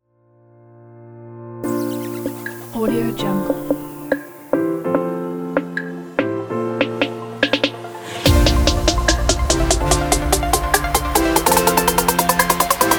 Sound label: the future bass